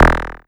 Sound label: DSP Hit; Sonic Foundry (Sony Creative Software); Twine-IDM